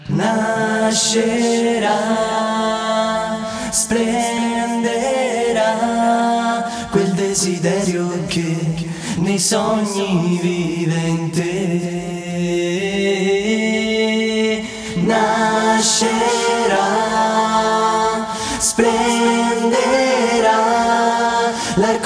acapella

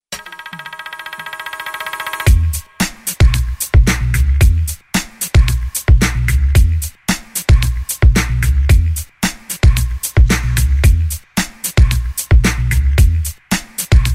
instrumental

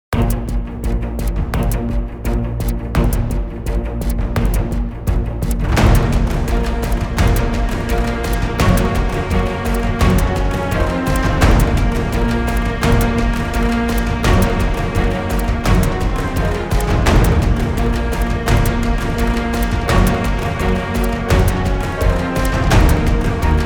no vocals

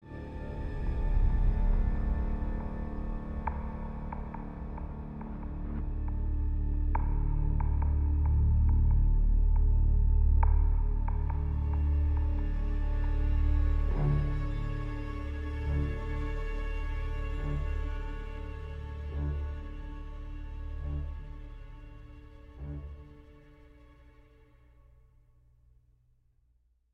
tension one shots

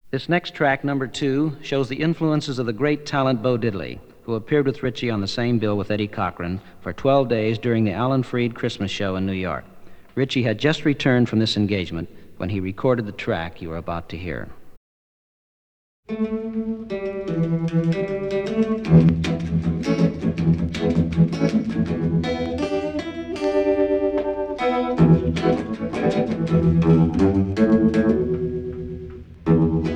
guitar instrumental

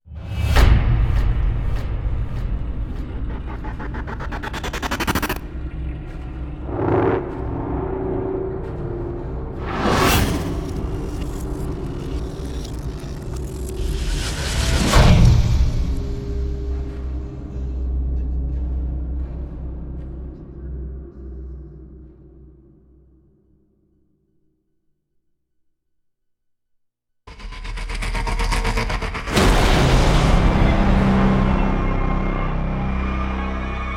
no percussion

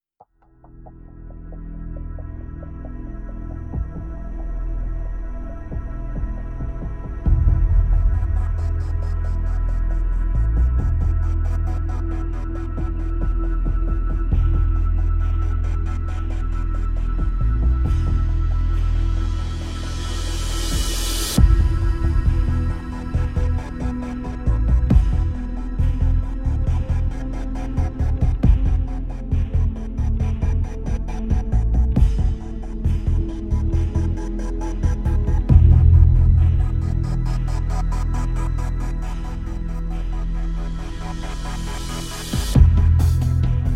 no melody

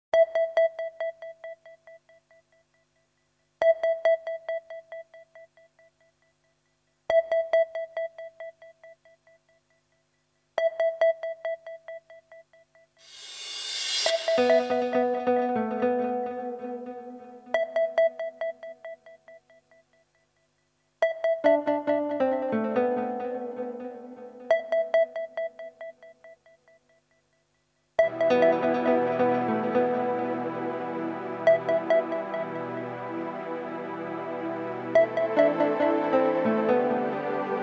indian trance mix

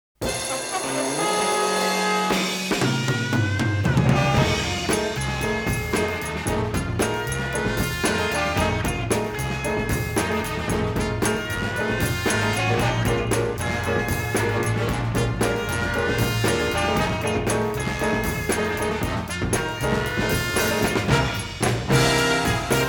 modern ballet background music

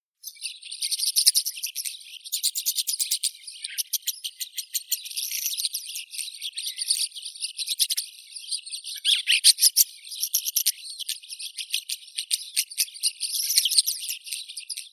skvorets rozovii sturnus roseus

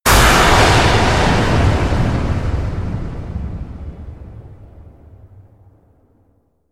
tentacle strike hit

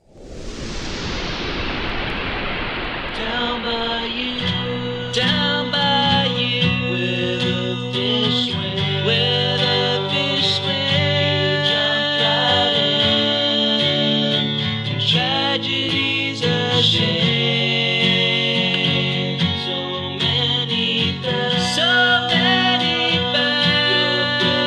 acoustic

